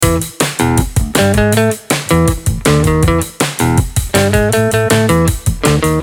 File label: Party Tune Loop